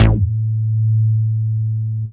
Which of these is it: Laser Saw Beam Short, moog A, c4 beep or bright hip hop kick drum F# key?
moog A